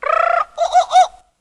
bird jungle; Animals

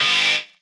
robot

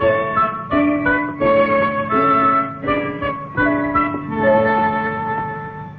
playful

